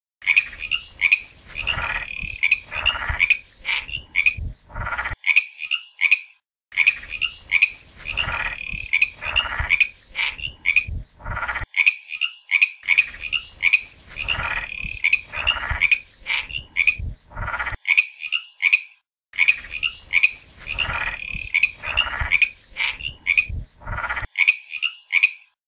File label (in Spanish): Sonido de la Rana. croar; sonidos cortos de animales